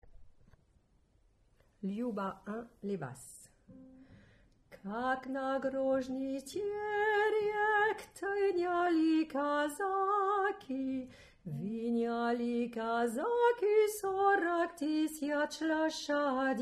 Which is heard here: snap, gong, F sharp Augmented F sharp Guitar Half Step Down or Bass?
Bass